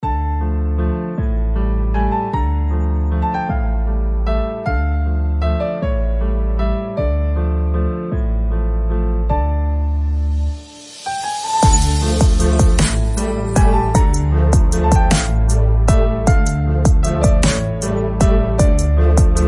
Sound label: Romantic piano background music for short video